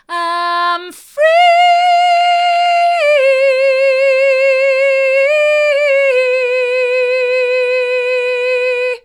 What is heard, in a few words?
hard, vocal